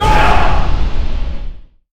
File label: Sound Effect - Aztez Dies